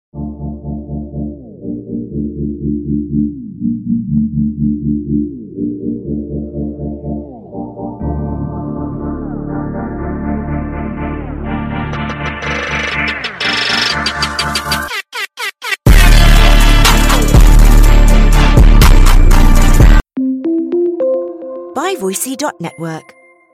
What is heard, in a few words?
Dank Meme Sound Music Effect